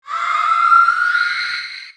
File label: scream